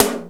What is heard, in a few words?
TOM, drum